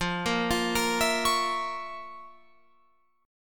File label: F Major 7th Suspended 4th Sharp 5th F, Guitar Open F; arpeggiated